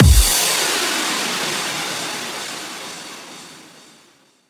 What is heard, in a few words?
bd